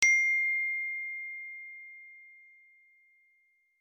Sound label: ios 18 sms original; tono de llamada